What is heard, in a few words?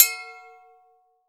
SPOKE HHO, SPOKE HI HAT